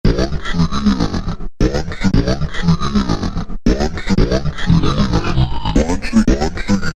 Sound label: Trans Vox, Roland SR-JV80-06 Dance